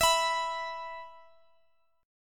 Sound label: E 5th, Guitar, Standard; strummed; chord; x,x,x,x,17,19